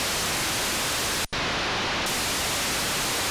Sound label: gmsk hk beacon; アマチュア無線